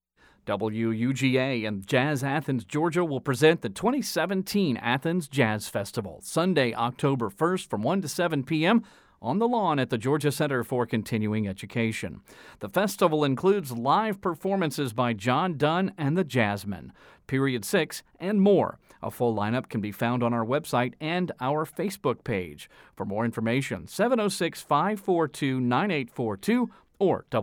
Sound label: jazz